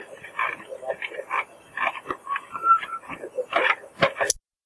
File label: whistle